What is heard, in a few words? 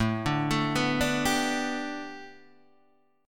A 9th Suspended 4th Guitar Open D, chord